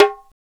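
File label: perc darabuka; Roland LCDP14 Africa VOL-2; Af. Hand Drm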